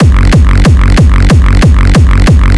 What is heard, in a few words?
Kick Bass Mega Tek F